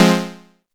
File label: Synth Cuts; Vengeance Samples; VES1 Instruments